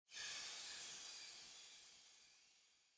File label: hiss